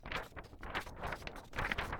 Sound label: Paper Rustling Movement Handling; The Soundcatcher; Paperlife